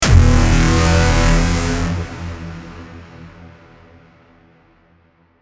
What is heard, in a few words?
Bend E